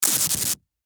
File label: Masking Tape Peel, Industry, Masking, Tape, Peel, Off; Offices